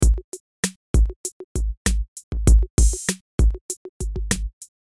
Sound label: Drum T808 Loop